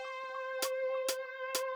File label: Wibbly; Weird